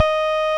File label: Roxichord, E.Piano